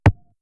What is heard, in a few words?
strike